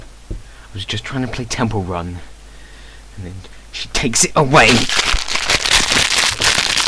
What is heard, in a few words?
happy buterflies and peaceful birds